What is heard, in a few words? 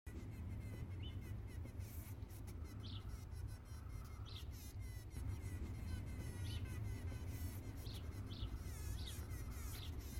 Hover Fly